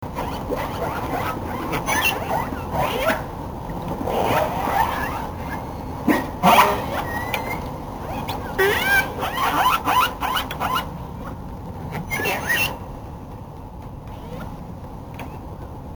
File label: Orca Whales